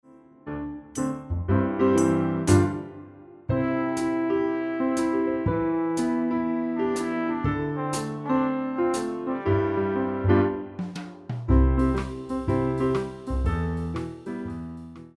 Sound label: accompaniment